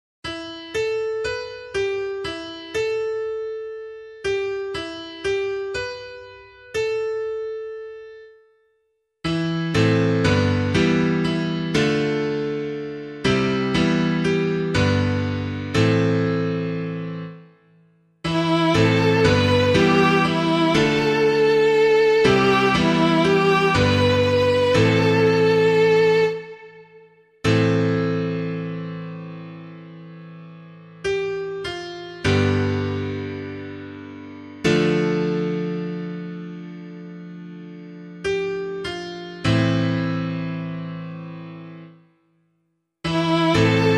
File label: Psalm - piano